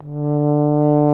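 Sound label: brass baritone; Roland - LA Composer vol. 1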